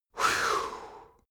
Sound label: male relief sigh; soundpool